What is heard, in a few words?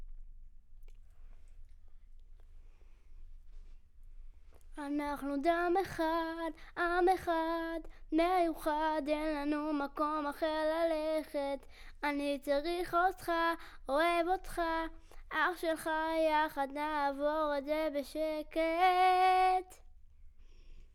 Children Choir; Studio Bekol Children Choir